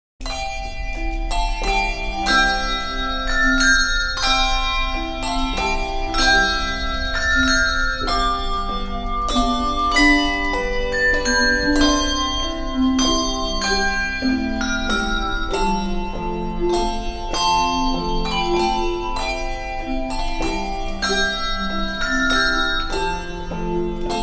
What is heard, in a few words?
Handbell, Christmas Handbells